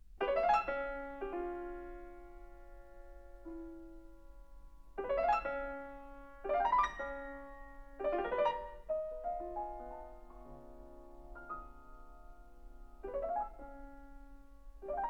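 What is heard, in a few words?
Poèmes for piano, Allegretto (6/8); Scriabin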